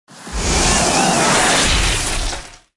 Music Frontend

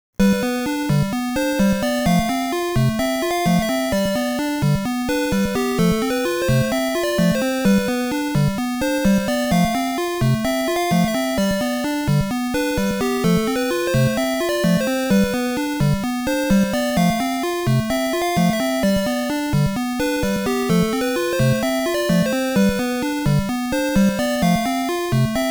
Indoors Theme; Zelda II: The Adventure of Link; FDS, NES

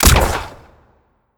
Aerobot Shot

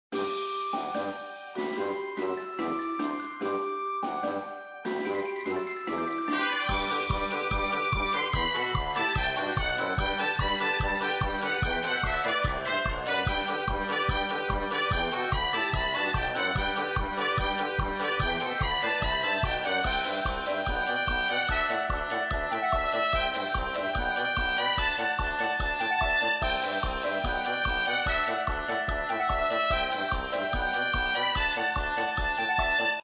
music, Start & Pause

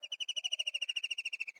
Amb Env Bird Chirp

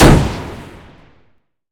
Fire; Mars Sortie

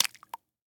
Foley Slime Drop Splat

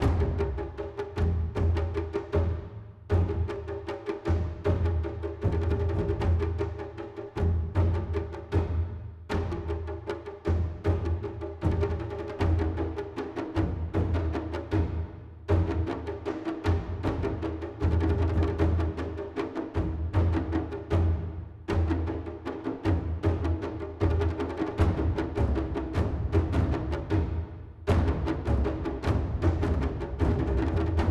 Colosseum Intensity

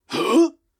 Miner Throw; Col Miner Throw

